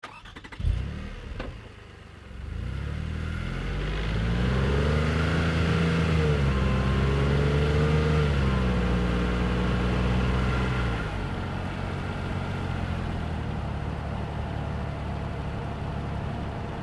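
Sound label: Car Highlander Outboard Drive Fast; 2005 Toyota Highlander V6; Cars; Vehicles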